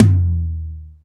TOM TOM, Real

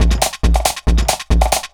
drum 138, Techno; Techno ID; PS-45

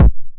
909 BD Tape Sat; Goldbaby Tape808 & Tape909; The Tape 909

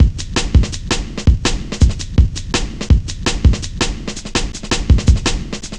Cut Thru B, Drum Loops, Jungle, 165.5; Zero-G Jungle Warfare 1, 2 & 3

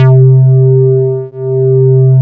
SIMPLE C3, SIMPLESYN

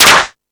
Rap Session, E-MU Pro-Cussion

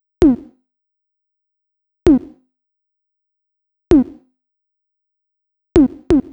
Tom Loop, 130bpm; Zero-G; Soundclash Nu Dance Electro; Construction Kits; Feet First

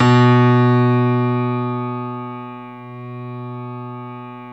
StereoGrand, Keyboards

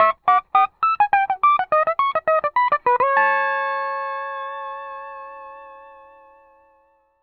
105 L, PD LOOPS

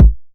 909 BD; Goldbaby Tape808 & Tape909; The Tape 909

